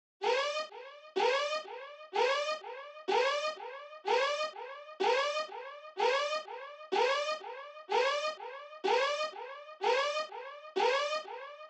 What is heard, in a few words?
125 BPM FX; Cymatics; D# Min